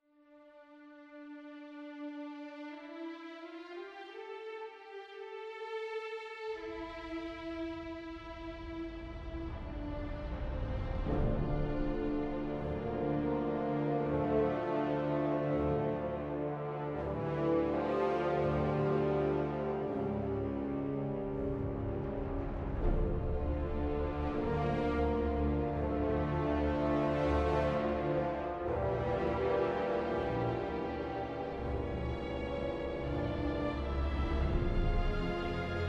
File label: Event Ceremony